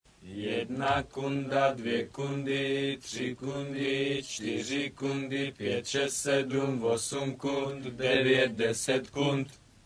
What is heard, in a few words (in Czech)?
hymna